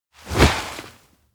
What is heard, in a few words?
beach wake